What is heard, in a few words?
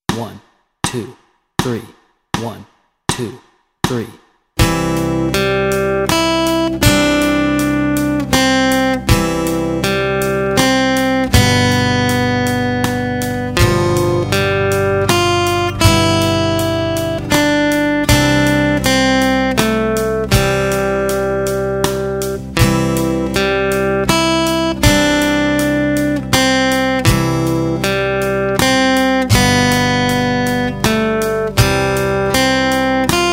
Rock a Bye Baby Melody and Chords Track, guitar